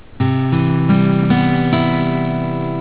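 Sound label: Cmaj7 chord, guitar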